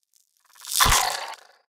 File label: mirelurk attack power run; npc